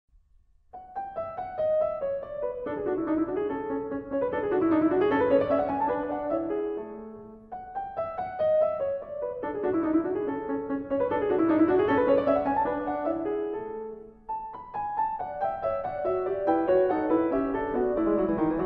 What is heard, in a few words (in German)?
Sonate